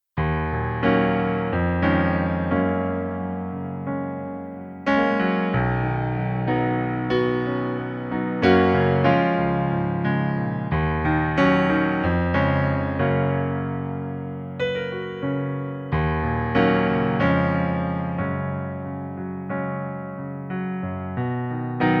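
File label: piano playback F# major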